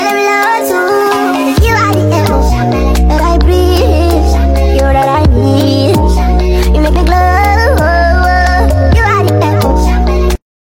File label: Sped Up